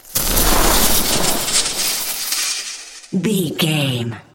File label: elec lightning magic spell